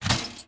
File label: toll bench open; Hollow Knight